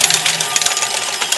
steal coin; classicro

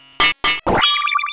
refine failed; classicro

